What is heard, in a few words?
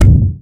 giant step; zoonomaly cat titan